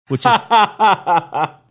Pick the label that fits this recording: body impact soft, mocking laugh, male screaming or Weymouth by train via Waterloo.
mocking laugh